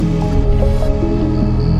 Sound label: bag in